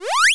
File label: swap; kokogems